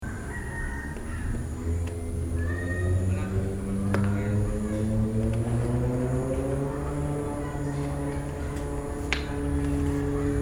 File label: mekong rockets